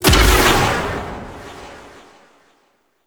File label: Blade Explosion; Warframe; Pax Duviricus